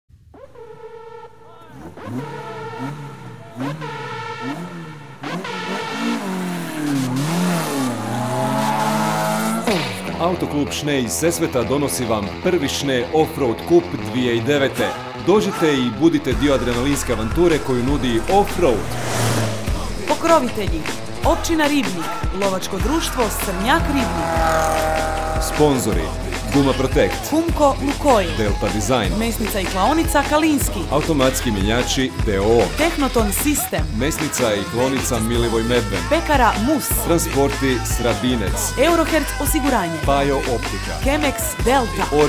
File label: OFFROAD; schnee off road